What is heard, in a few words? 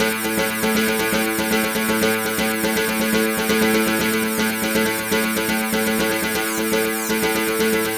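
Sequence Pattern, Keyboards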